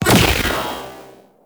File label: Barrage Fire; Warframe; Sentients; Ortholyst